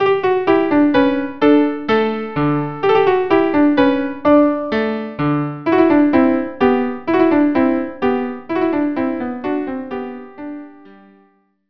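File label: violin, viola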